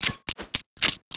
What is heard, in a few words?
knife inspect